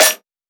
mouth snare; M8

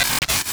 Glitches; Antidote Advent

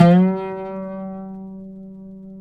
OUD G; Guitars; Spectrasonics; Hans Zimmer Guitars VOL-2

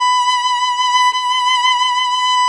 VLN B4; Sonic Reality; American Heartland

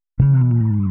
Bass, Indie Rock; Sonic Foundry (Sony Creative Software); Indie Rock Anthems; Session 12 - Spaced Out Knoll

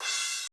cymbal, Roland MC-909

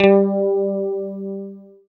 GUI, E-Guitar; Sound & Vision; Gigapack I CD 2; GUI E-GUITARS; GUI E-Guitar 1

